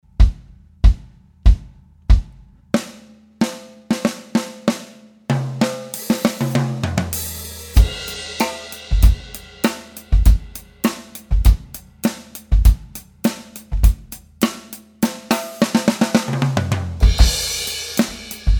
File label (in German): Gesamtmix bearbeitet mit Hall Snare aligned, Drums Handyrecorder; DF-Nord Drums Handyrecorder